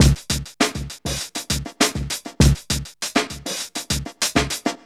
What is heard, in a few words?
FUNKY; Zero-G; Funky Elements